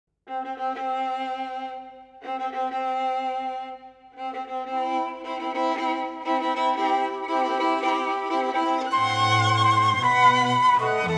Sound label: wedding march